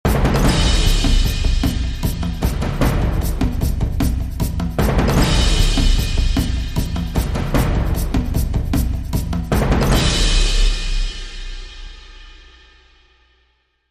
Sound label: Dramatic Rhythm Builds To End